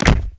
wall hit, headcrab poison; hl2

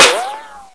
ric, weapons; hl2

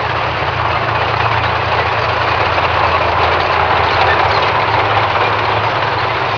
tank treads loop; hl2

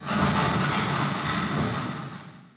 rubble; hl2

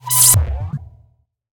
WIPE, Ui; Scoba Sounds; Scoba Ui Essentials

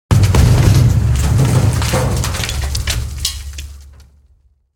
dry hard metal impact with rust debris; Alexander Kopeikin; Black Metal

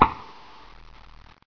peek scratch, antlion guard far; hl2

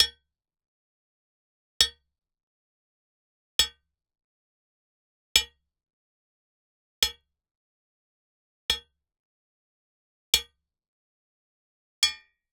Iron - Thick - HIT - Hammer; Pole Position; The Metal Hit Sweeteners Library

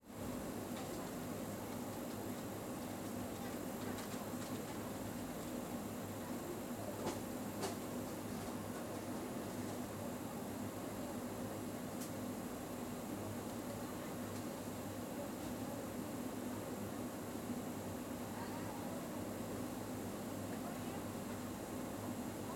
store belgium, INT; 3maze

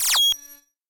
Power Down, SCI-FI UI; David Dumais Audio

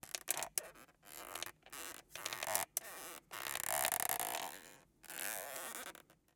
Hair Dryer Foley Cable Tension, Broken Hair Dryer; InMotionAudio

